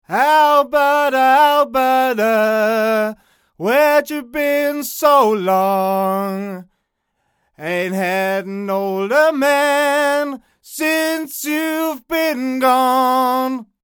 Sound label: Mojave MA301fet S1 near